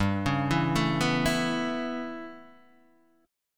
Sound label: A 9th G, Guitar Standard